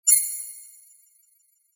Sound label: ui login